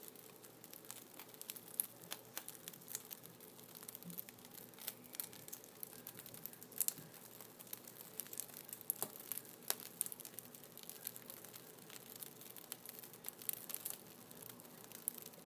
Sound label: Fresh Bread Crackling